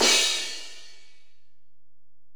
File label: Ride, Alesis DM-PRO